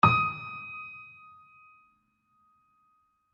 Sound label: Note E Flat